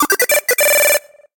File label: Music Effect Solo Chip Square Positive